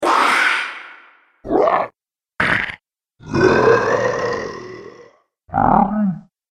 Monsters A Collection Of Nasties, Monster Sound Effects